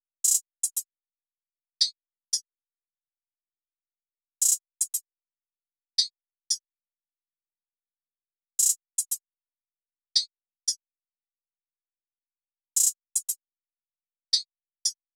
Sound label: Drum Loop, 115 BPM Hihat; Loop Stems; Cymatics; Vibes II Drum Loop Collection; Round Two